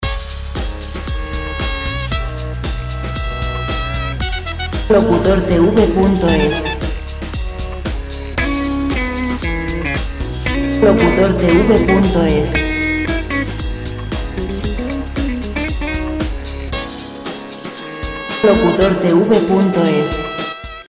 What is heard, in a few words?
115 jazz; música sin copyright; musica libre; musica television